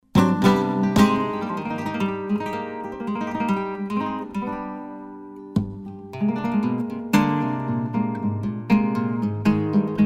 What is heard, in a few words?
Solea de Cordoba, flamenco